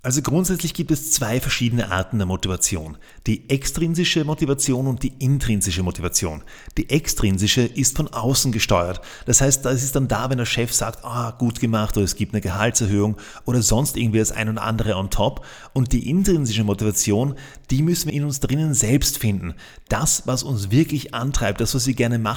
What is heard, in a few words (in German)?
applaus